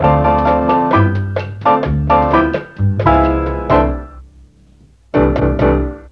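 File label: bass strings, Mellotron; Mike Pinder - Mellotron Archive CD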